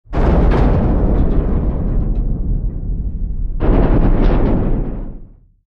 Opening, closing the hatch of a submarine (under water)